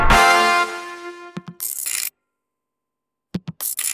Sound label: funny